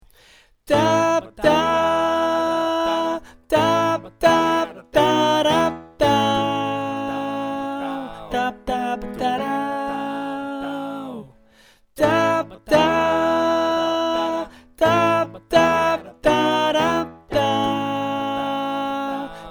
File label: last train home mezzo